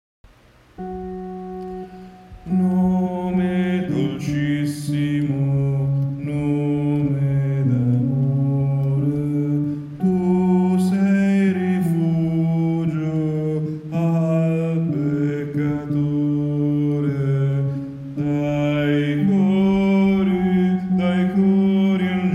Nome Dolcissimo Contralti

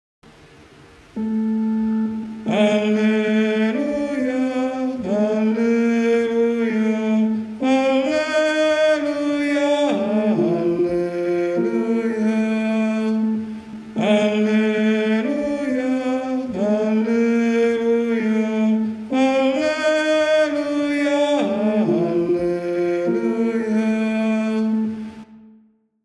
Alleluia Soprani; Lourdes 2017

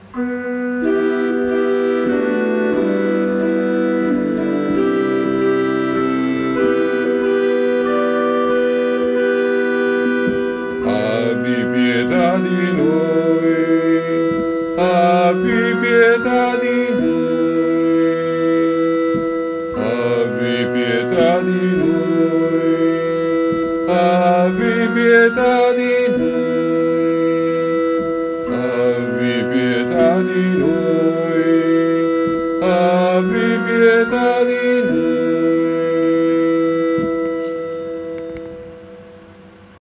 Agnello Di Dio Uomini

